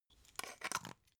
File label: glass pepper close; berufe; gastronomie; soundpool